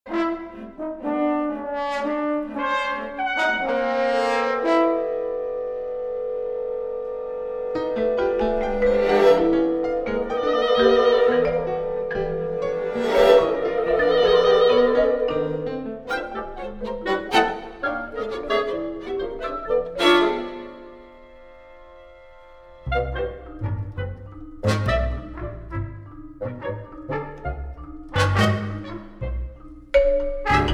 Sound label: alarm will sound